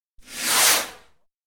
Fireworks, Rocket; Chinese New Year; BBC

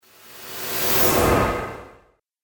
Ghost Whoosh Appear And Vanish; Whoosh Sound Effect

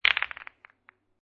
Billiard Pool Snooker Billiard Spread Shot Softer; Sports

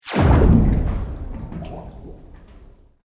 Sweep Organic Medium

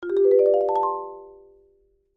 Marimba Ascend, Mallets; Musical

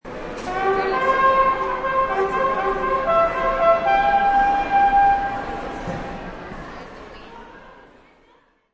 Bugle Crwd, Bugle plays with crowd noises.

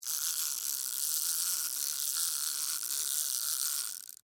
Wind Up Toy Run, Slow; Foley; Wind Up Toys

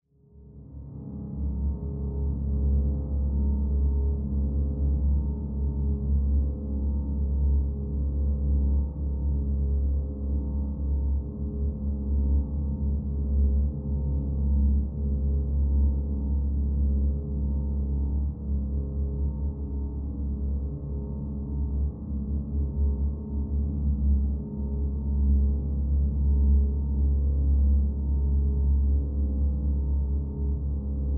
Drone Dark Low, Horror Drone Dark Low; Horror Drone Dark Low Dissonant Strings; Scary Tones; Sound Effects:Horror

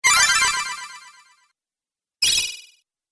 Magic Spells; Magic Sound Effects

